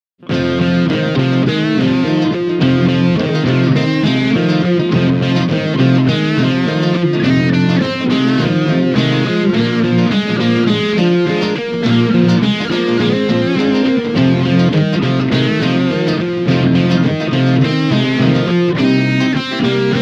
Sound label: play loud rock it out